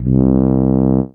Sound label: E-Mu Proteus FX Tuba C2